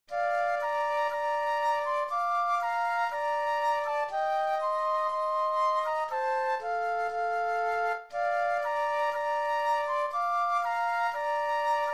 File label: flute duet